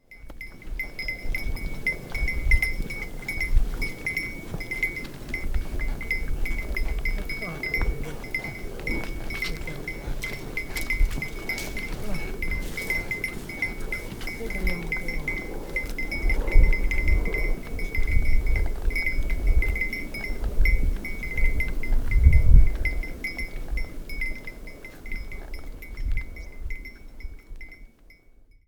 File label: Camelbell Great Thar Desert; Environments; Samuel Corwin; Selected Field Recordings from India and Nepal Volume III